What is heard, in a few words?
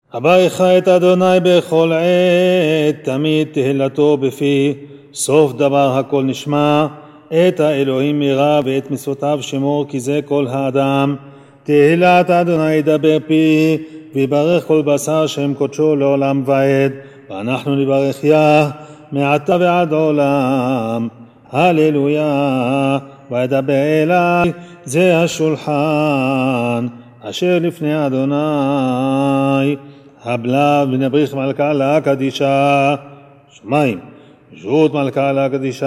Zimun; birkat hamazon